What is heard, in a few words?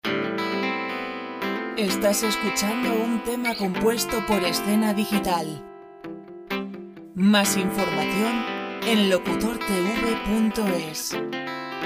65c lent guitar; Música pop sin copyright; Música pop sin copyright para spots; Música pop sin copyright para internet